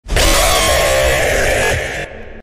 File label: mouth jumpscare; endo c